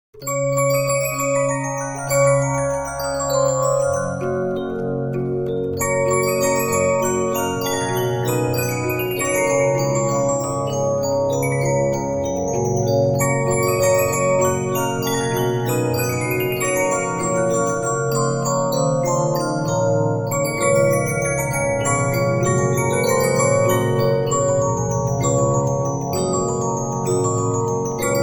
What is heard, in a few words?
Regina, 15inch disc musicbox